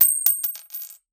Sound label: Coin Drop Concrete Floor